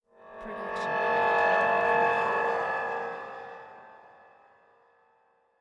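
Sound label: scifi ambience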